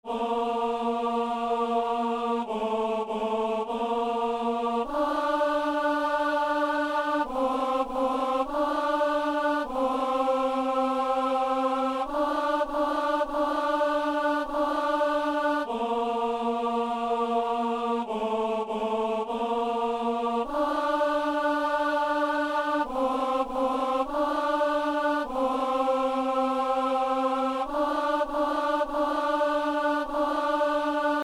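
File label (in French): anamnèse ténor; messe de san lorenzo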